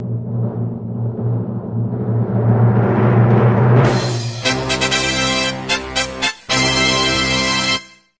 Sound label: winner